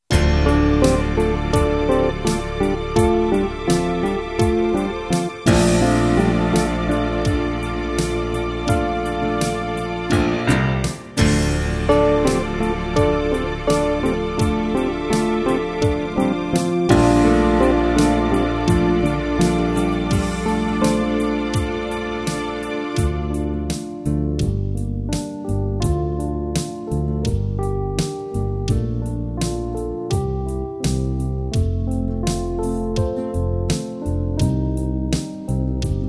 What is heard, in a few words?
brass minus